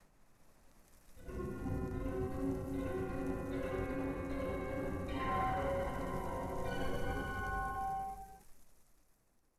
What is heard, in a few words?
wind